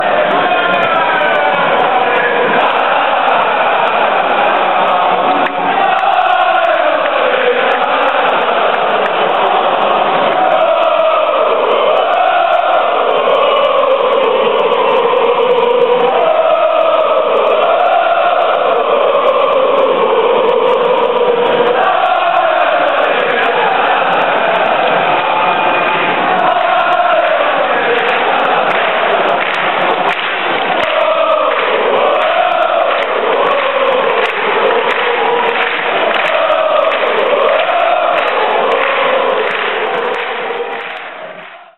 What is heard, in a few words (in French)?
allez lens allez lalalala hohohoho